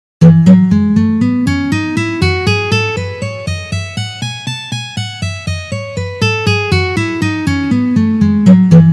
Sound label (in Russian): Gamma Mi mazhor Tri noty pozitsiya